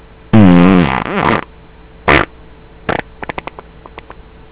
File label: fart